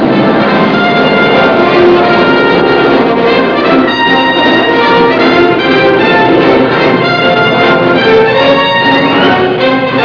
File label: music; indiana